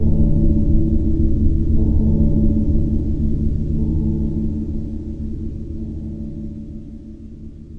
tonal gong noisy; Gongs and Super Crashes; single hits

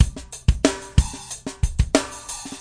92 bpm funki sharp, drum loops and breaks; 091 to 100 bpm

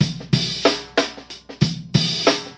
sparse compressor, drum loops and breaks, 93 bpm; 091 to 100 bpm

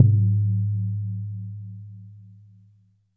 okedo, Japanese Drums; ETHNIC and WORLD PERCUSSION

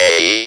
speak and spell A; ALPHABET

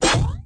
vintage cartoon zonk; Cartoon Sound Effects Kit

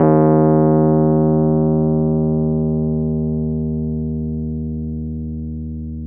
RHODES EB1, Rhodes electric piano

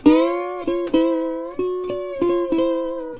hawaii guitar

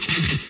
grunge hit; Punches Hits Discoblasts; single hits

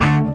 orchestra string hit D; Orchestra String Hits; PADS and STRINGS

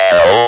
speak and spell L; ALPHABET

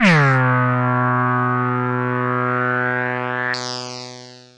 SH101 Tone

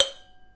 glass glass tap

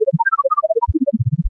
random blooping, Electro and Synthetic

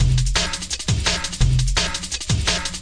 170 bpm rolling in a tube, drum loop

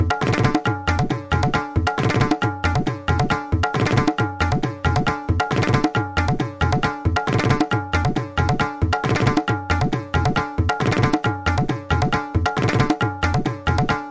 tabla beat; 136 bpm; 131 to 140 bpm; jugalbandhi

